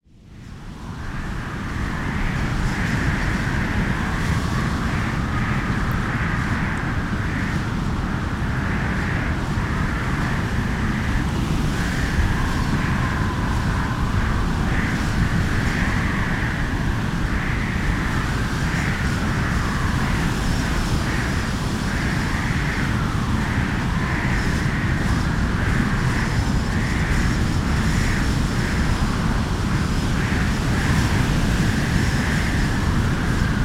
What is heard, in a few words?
wind